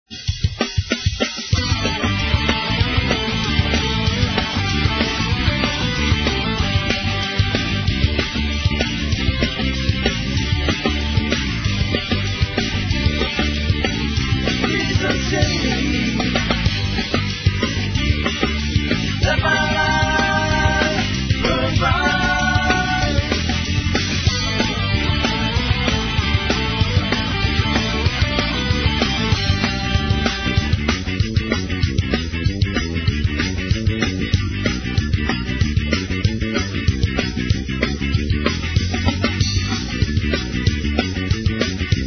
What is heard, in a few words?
ACCOMP